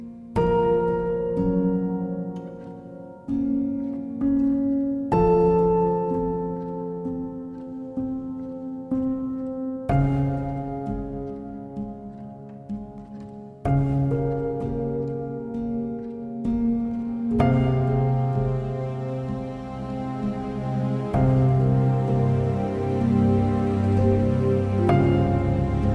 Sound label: ringtone